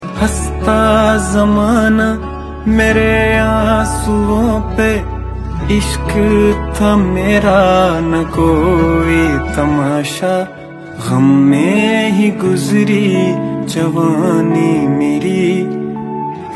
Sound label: Ringtone